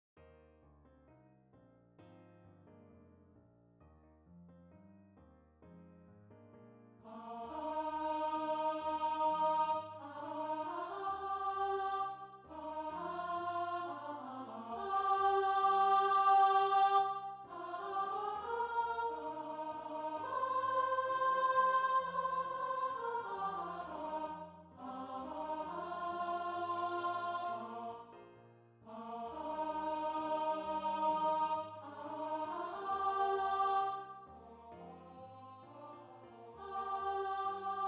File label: sops, choir